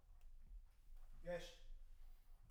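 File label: Guitar Mic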